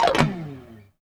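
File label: chorus slide 1 L, guitars; Spectrasonics; Hans Zimmer Guitars VOL-1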